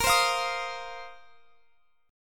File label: A sharp Suspended 2nd Suspended 4th A sharp down Guitar Open F; A#sus2sus4 strummed